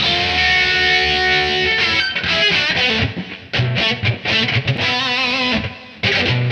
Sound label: 122 rhythm